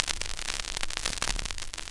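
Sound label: Vinyl; Roland JX-305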